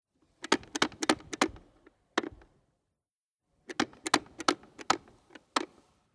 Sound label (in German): Heizlüfter Dyson Hot AM04 manuell drehen